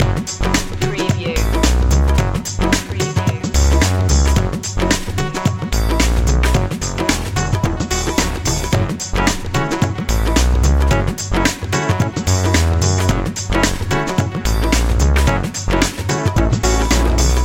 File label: mumbai groove loop